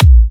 A, kicks; markus hakala kicks

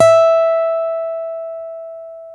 STEEL, Guitars

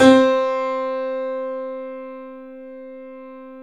C, 3-D Audio Collection, 3D Pianos, Yamaha C7; E-MU Producer Series Vol. 5

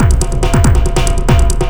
Beat, Drums & Percussion; Electrocution; Beats

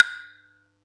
Yamaha EX5, Drums

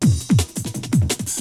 BREAKBEATS, TECHNO

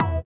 SITAR; Spectrasonics; Metamorphosis; INDIATEK

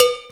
cowbell, Percussion; InVision Interactive; Percussion Slam

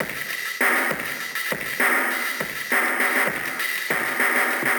Ghost Loop 100BPM, drum loop

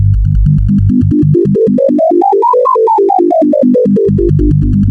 KAWAI; Rubber Chicken Software Sample Bank

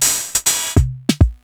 Roland PB-300 Rhythm Plus

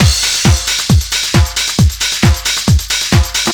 crash, Deep House, 135bpm

